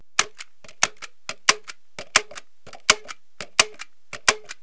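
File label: staple, construction; KABOOM! - Wild and Wacky Sound Software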